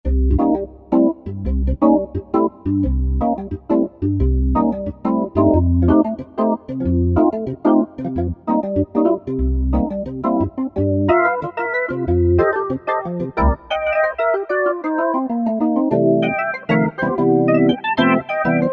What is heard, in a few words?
Jaz Funk Org; Generalmusic Equinox